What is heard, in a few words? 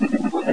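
scuttle; Total Chaos Nukem 3d Pack; duke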